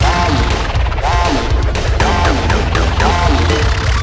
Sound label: text warn